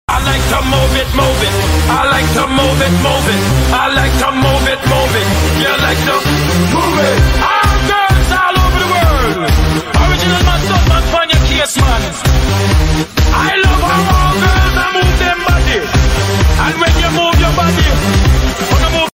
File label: cartoon song ringtone